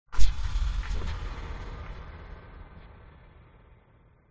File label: sound design cinematic hit boom bright scary tail